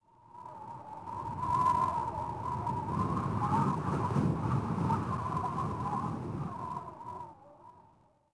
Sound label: wind; Star Wars Obi Wan; XBOX